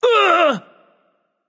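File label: non verbal ouch, voice; cernunnos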